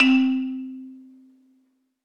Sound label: Pluck 9 - C; 99Sounds; Monosounds; Music Loops; Instrument Oneshots